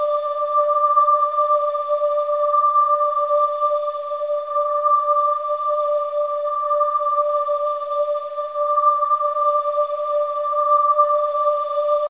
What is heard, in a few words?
Mus Church